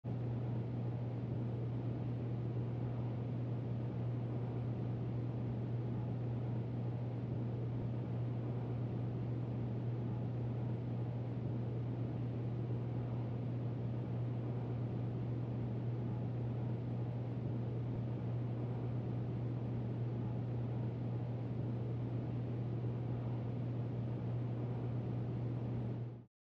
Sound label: Ventilation Noise Li